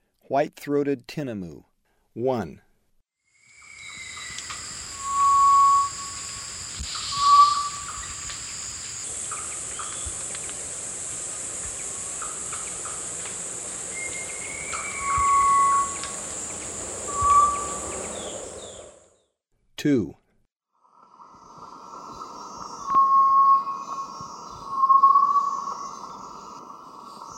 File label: White throated Tinamou, Birds of Ecuador; S. America